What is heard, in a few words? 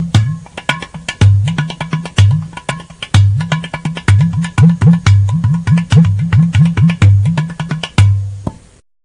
udu